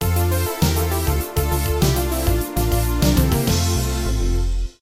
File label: game over; msx; goonies